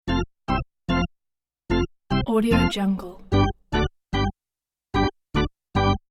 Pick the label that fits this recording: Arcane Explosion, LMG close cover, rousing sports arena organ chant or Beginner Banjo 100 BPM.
rousing sports arena organ chant